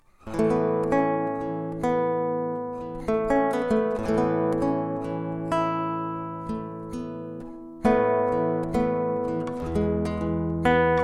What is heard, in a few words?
karaoke